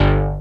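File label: LIVE BASS, MPC-60 DRUM; Greytsounds VOL-1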